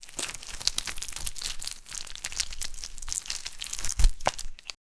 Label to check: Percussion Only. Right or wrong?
Wrong